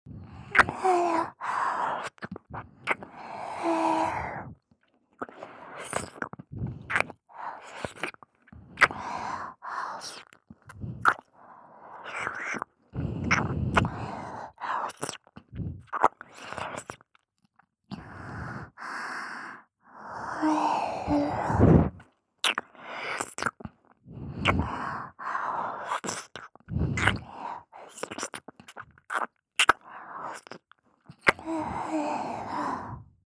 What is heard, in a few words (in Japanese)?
耳舐め, ASMR